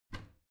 object cabinet bathroom cabinet both open regular; smartsoundfx

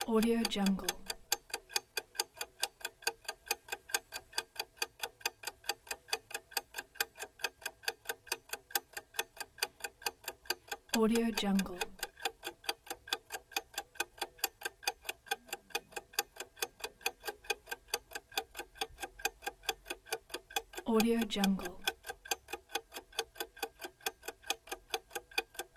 clock ticking

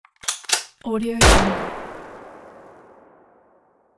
gun shot; stefanmollov

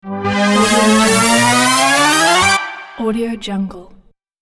rise; zverev

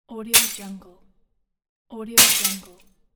the broken glass

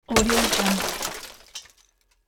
breaking car window

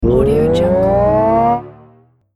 enlarge expand

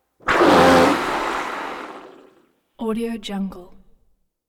whale spouts

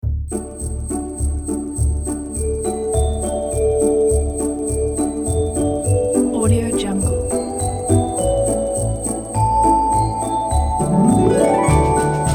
jingle bells orchestra

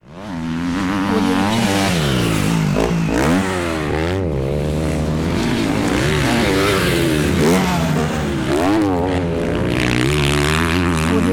motocross race

